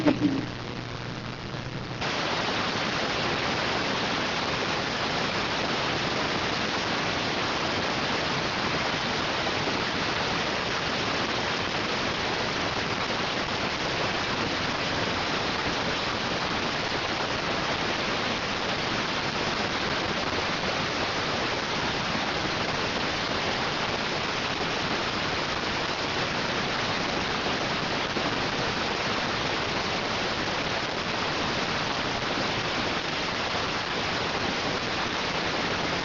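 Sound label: radioteletype receive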